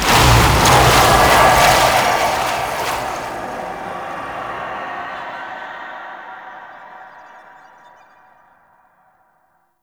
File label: Hex Melt; Warframe; NineteenNinetyNine; Stage11FuturePast